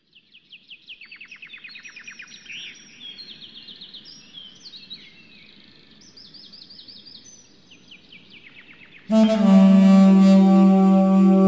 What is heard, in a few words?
duduk with nature, 432 hz